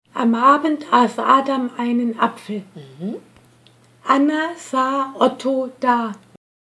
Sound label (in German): stimmlippe